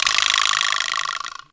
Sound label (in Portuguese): CRECEL; East West - Global Sounds; AFR.BRAZ